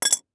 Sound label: Industry Tool Wrench Set On Pavement